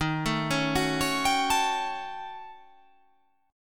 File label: D sharp 7th Sharp 9th Flat 5th